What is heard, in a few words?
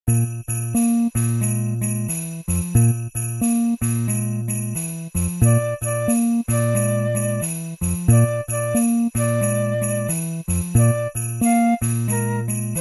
Jingle Bells Jazz